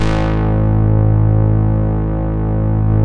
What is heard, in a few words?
EMU MODULAR, modular syn